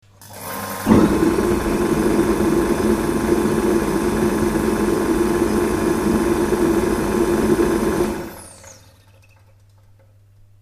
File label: jet